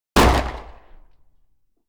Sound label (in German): detonation; geraeusche digital